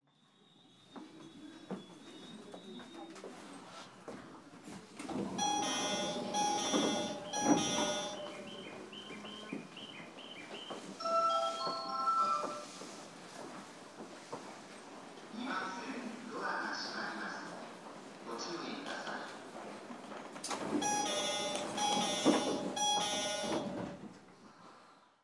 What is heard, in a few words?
door